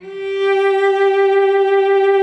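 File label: G4, cello vibrato; Xsample VOL-7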